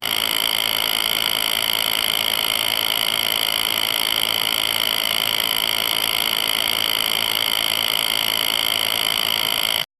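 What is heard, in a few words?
old cinematograph projector; historic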